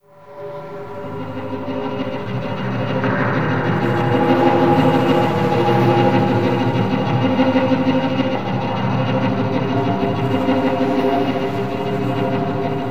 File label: Door Breach; medal of honor warfighter